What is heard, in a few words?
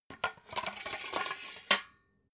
metal scaffold pole retract; industrie-wirtschaft